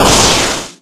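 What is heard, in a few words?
Battle Skill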